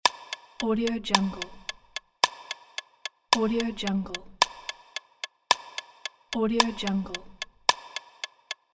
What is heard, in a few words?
clock ticking; olibrykov